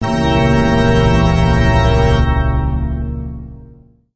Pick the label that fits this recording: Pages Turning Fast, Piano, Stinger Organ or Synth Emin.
Stinger Organ